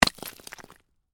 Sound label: Ice: medium chunk of ice lands on hard surface; Snow; Stuart Duffield